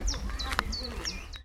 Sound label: bird